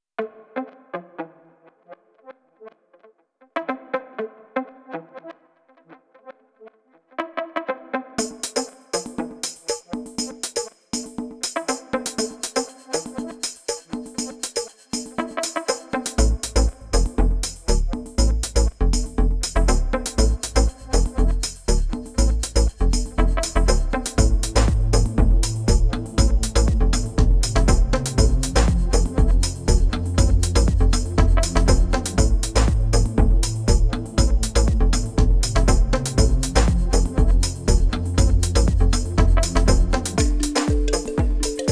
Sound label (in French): afro house